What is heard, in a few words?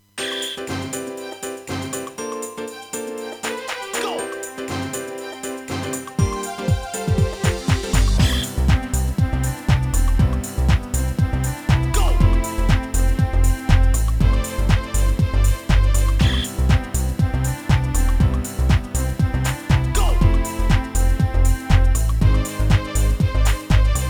Hip House; psr-350